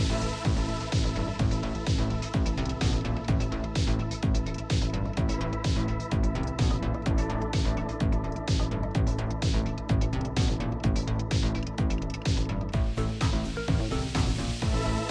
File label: boombox music